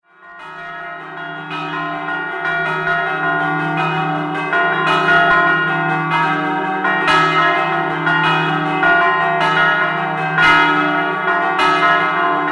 blessano, campane

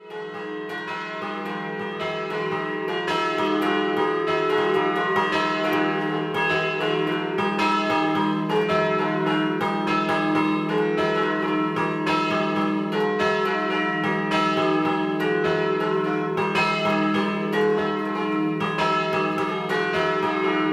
Barazzetto Scampanottata

